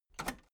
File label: metal lever button radio enable fast